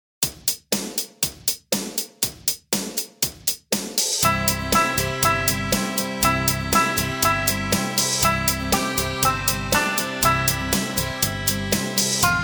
Jingle Bells

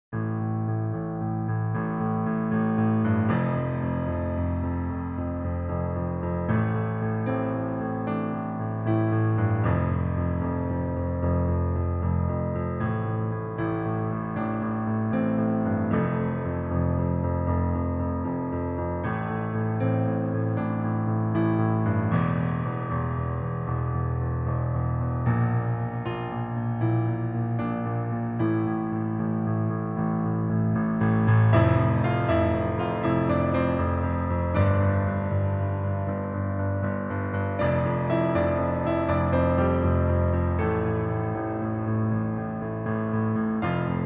piano cover; westworld

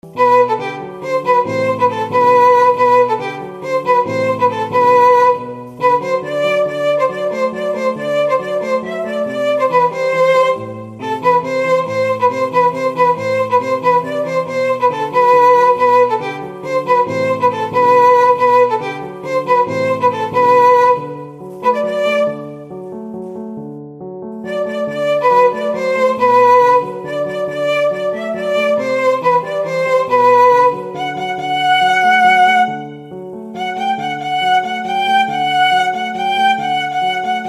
violon

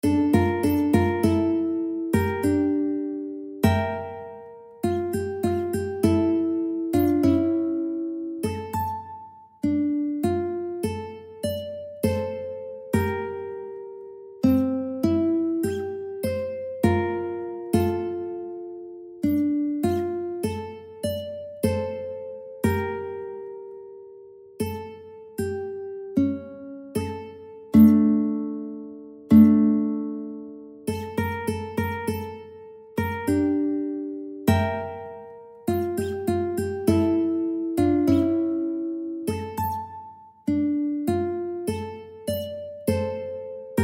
Lonely Bar Piano

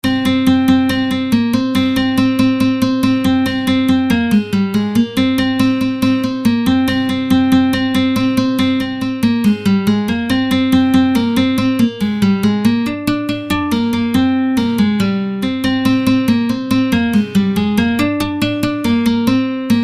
guitar

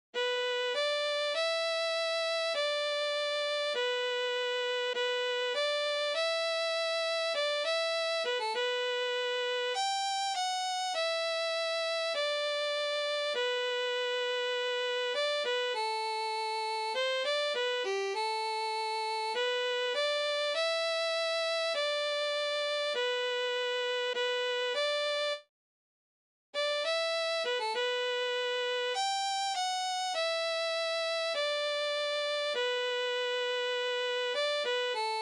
Nightingale (Violin)

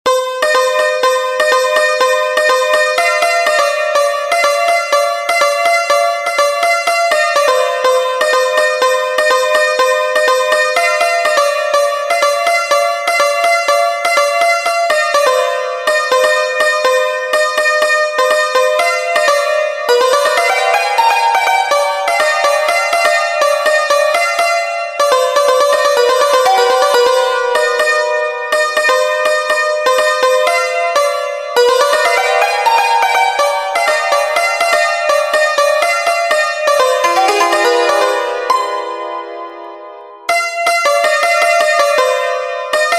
santoor

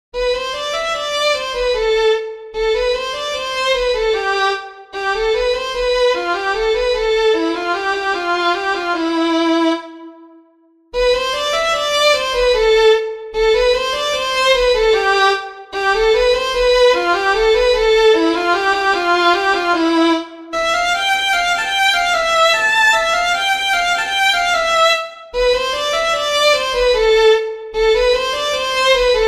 For Violin

